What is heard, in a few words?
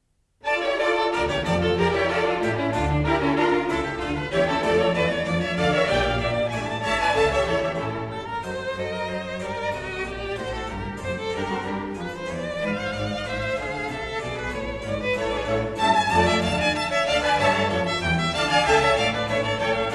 Double Concerto, Violin Concertos; BWV 1043; Johann Sebastian Bach